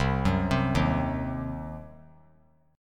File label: C Diminished C, 3C,3F,3A,3D; arpeggiated; JGuitar